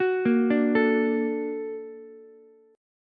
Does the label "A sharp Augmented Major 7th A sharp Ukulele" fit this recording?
Yes